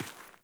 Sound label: Sandals Run Rock, Footsteps; Characters; Player